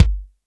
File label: kick, Roland R-70, BD